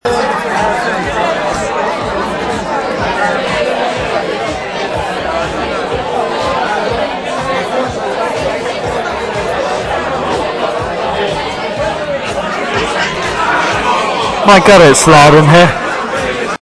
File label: peaceful friday night